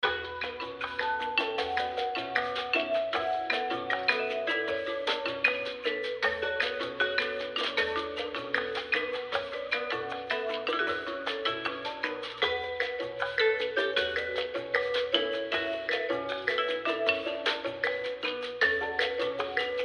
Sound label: Elevator 16 Bar Loop Fx; Agent A: A Puzzle in Disguise; Windows